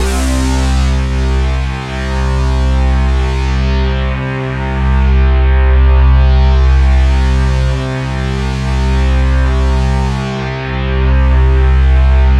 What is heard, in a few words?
T8-SYNC, JV-1080